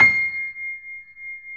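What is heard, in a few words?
grand piano C 6; USB Soundscan; AKAI; Keyboards Acoustic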